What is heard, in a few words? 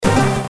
Slots Stop; Pokemon Reborn E19